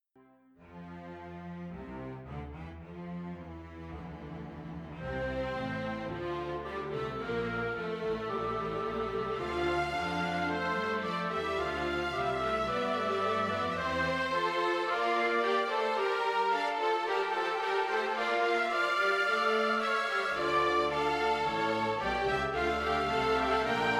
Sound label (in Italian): Doppia Fuga a quattro voci in fa maggiore Orchestrazione; di Gerd Prengel